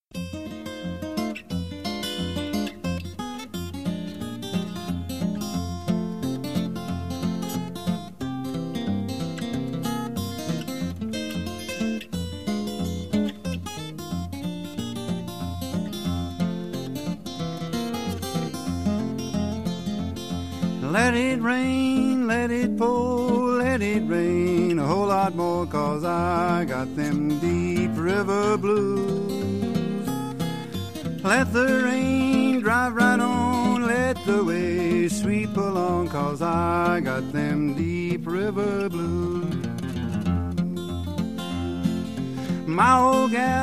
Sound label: Deep River Blues